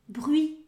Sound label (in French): bruit